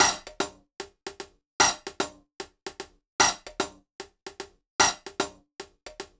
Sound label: HH, Ragga & Dub Reggae